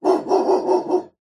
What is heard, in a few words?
animals dog medium sized 5x barks fast slight distance